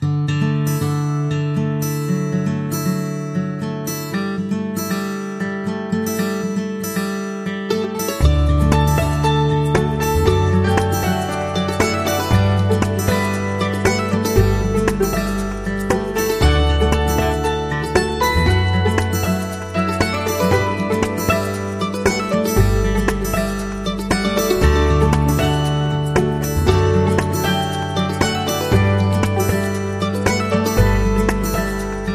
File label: Happy and inspiring family